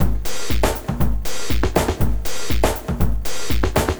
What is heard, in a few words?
Noise Beat; Drums & Drones